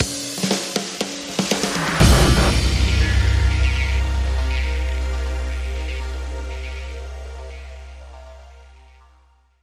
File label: Beginning Sting